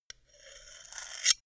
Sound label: scissors scrape